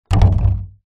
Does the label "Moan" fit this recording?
No